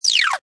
Cartoon Chirps, Zip down, slide down, cartoon fall; Comedy/Cartoon; Funny Sounds